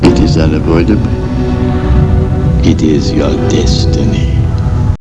Exclamation; emperor

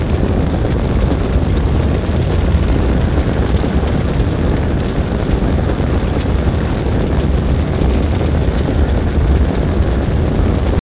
bigfire; troopers; structures

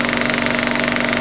projectr; REDNECK; RAMPAGER

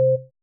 beep; camtasia; MenuMaker; Textured Grid

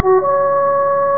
horn; warsamples

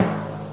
drum; kidsplay

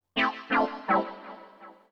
SYNTH HOUSE 125 A; eJay; Keys

